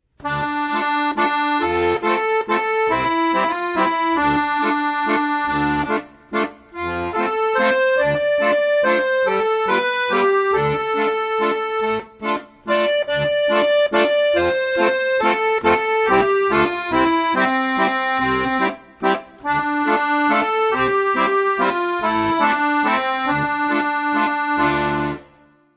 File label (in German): Scarborough Fair, Akkordeon; Akkordeon Workshop Band 1